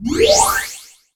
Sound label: Synth; sonic-boost